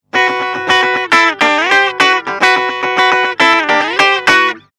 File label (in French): tele clair chevalet vol5; fender champion600